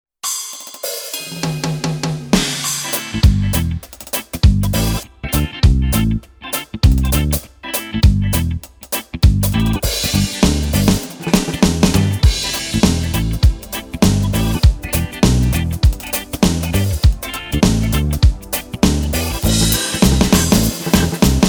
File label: reggay yamaha